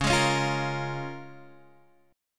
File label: Db 6th, Guitar, Open G